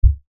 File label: LFE Hit, Lfe Hit Low Bass Impact; Blastwave